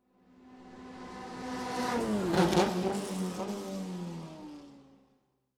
Race Car, USF2000, Turn, By, Decelerate, Fast, Choppy, Irregular; Motorsports; Airborne Sound; Motorsports 1